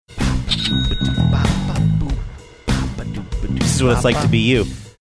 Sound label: singing along funky bass; saying this is what its like to be you